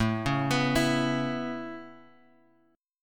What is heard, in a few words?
A Major Add 9th A Guitar Open F, chord; Aadd9 arpeggiated